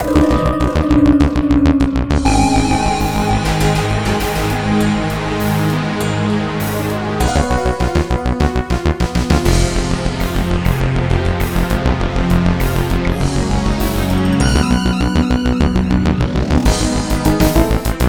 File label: Boss fight; zombie infection 2d